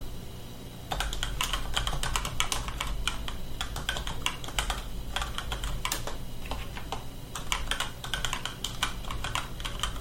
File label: Razer Pro Type Ultra typing sound, ワイヤレスゲーミングキーボード